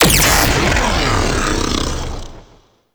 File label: Mega Laser Fire; Teralyst; Ropalolyst; Abilities